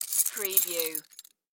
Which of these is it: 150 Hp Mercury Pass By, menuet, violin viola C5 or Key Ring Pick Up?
Key Ring Pick Up